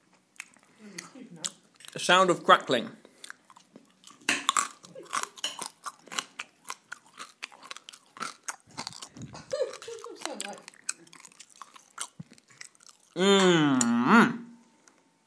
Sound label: crackling